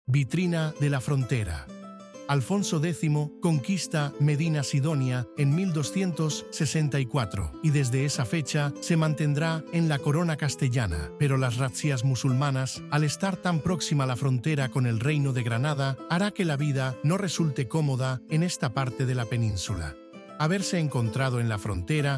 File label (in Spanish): audioguia música